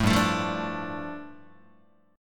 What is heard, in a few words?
G 7th Suspended 2nd, Guitar; strummed; JGuitar